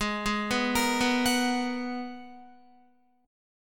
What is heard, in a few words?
Gsharp Minor 9th, Guitar; G#m9 arpeggiated; 15,11,11,15,11,14; JGuitar